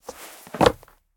Jump; MiSide

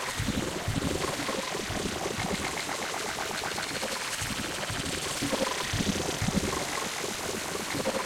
Glitch Furniture; MiSide

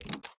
Key Hint Lock; MiSide

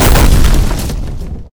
Car Explode; Game rip; MiSide

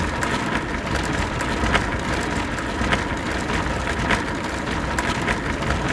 Chair Move; Game rip; MiSide

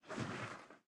Chair Move; Game rip; MiSide

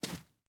Step Marble; Game rip; MiSide

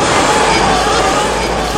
Screamer Spider; game audio; BigHead; MiSide

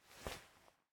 Throw Bomb; raw game audio files; Mita; MiSide

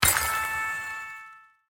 Win; MiSide

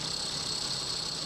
Lamp Move; Game rip; MiSide

